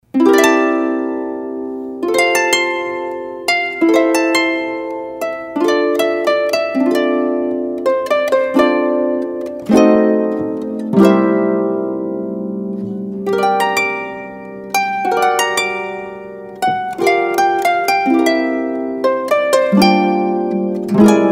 harp

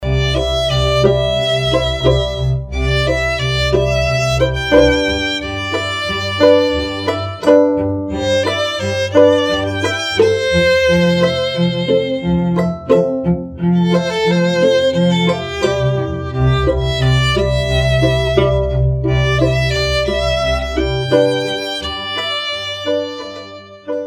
Pop String Quartet

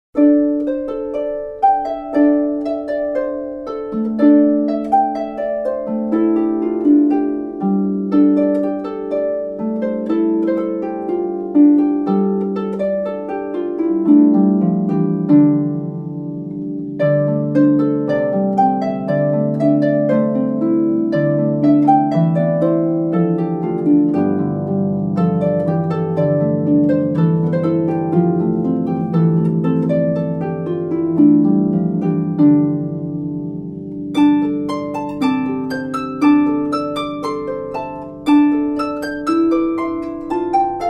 Harp; Kari Gardner; Embroidering

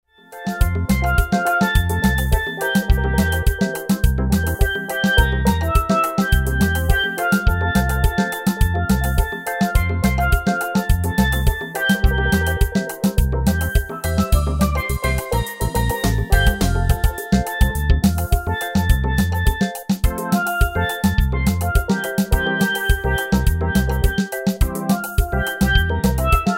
Steel Drum

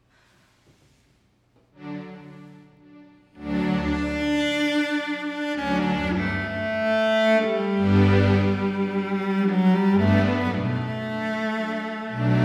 Cello

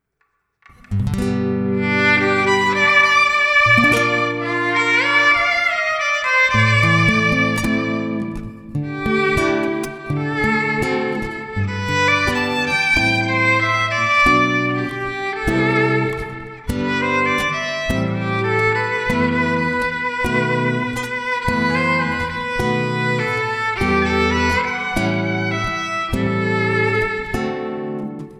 Violin Guitar; Acoustic Duo

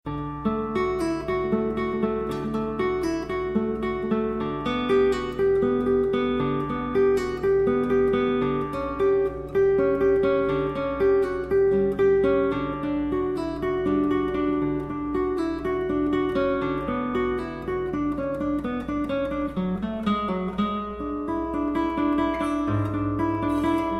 Prelude from 1st Cello Suite, acoustic guitar; David Youngman

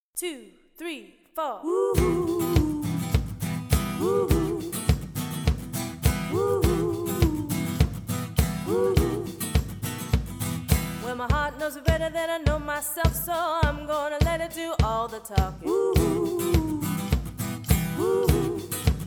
cover band

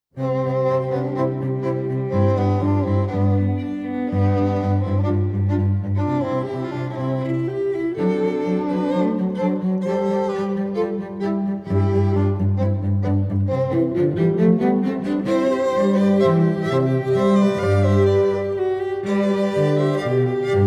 Allegro, String Quartet; Mozart; from Quartet K157

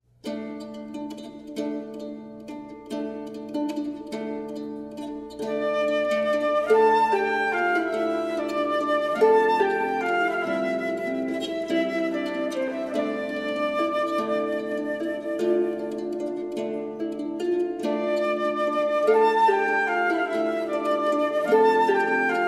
Harp Flute Original Celtic

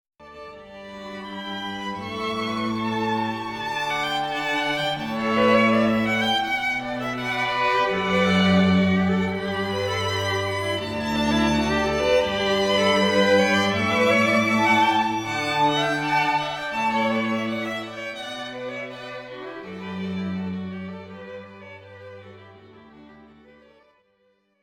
String Ensemble; Canon in D

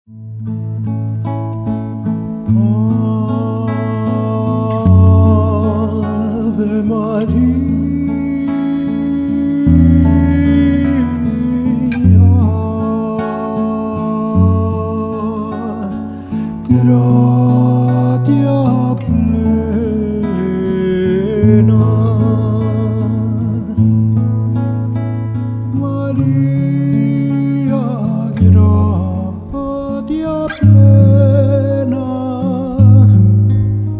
Male Singer; Classical

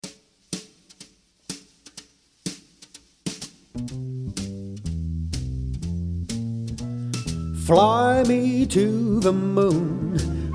Orchestra

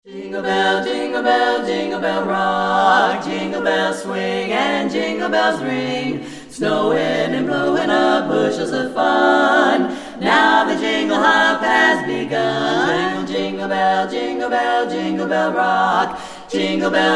a cappella group

